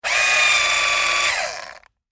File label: Industry Electric Drill Tighten Screw Whine Stress; Drill Sound Effects; Drills (Sound Effects:Tools); SFX Bible 6